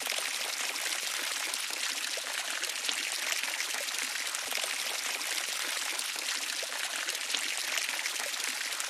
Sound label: fountain; pond5